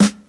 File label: hot snare single hit g key